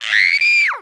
Boy 6yo Scream; mono

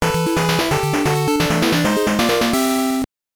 Jingle; Fastest Lap; gb

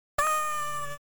falling

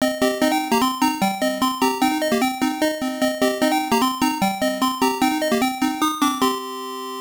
Level Clear; dragon wang sg 1000

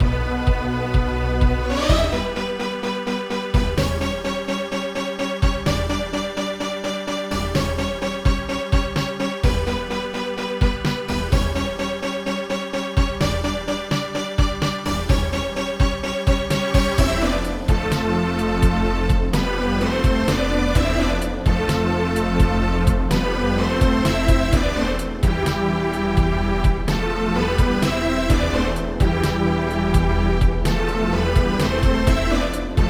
Indefinate Snare; Marica Shinjitsu no Sekai; Saturn

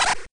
eat Run; Dino Run

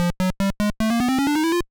Jingle; Kawaii Pet Shop Monogatari 3; gba

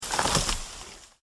Falling Tree

